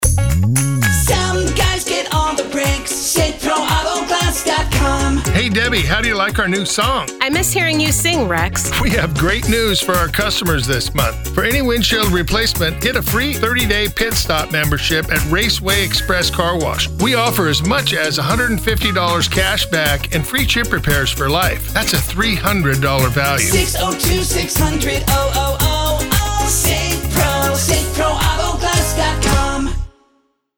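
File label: auto glass repair jingles, acapella jingles; Safe Pro Auto Glass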